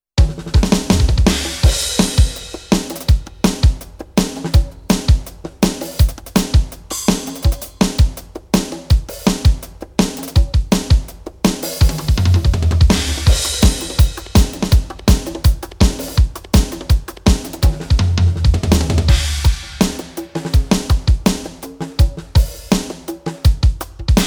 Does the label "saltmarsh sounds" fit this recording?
No